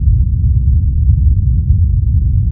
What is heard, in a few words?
gravi rumble, anomaly